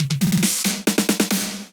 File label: Percussion Fill